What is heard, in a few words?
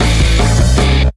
Exiting Vehicle, Jingle; The Simpsons Hit & Run; PS2